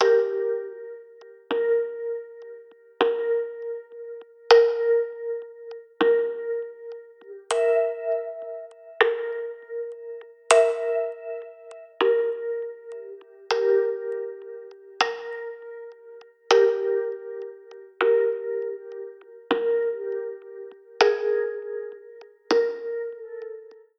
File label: clock shop